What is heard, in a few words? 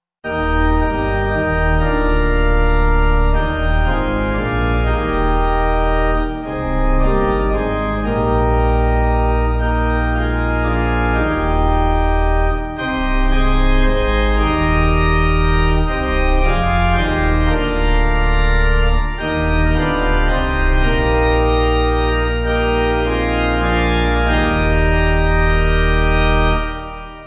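Pipe Organ, Music Backing Files for Churches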